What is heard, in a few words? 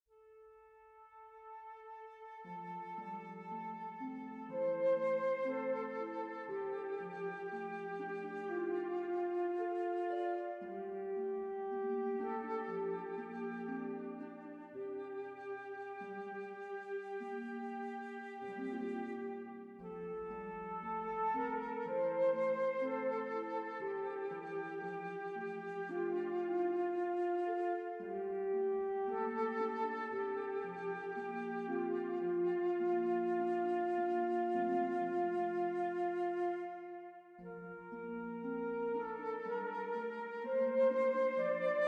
opening ins only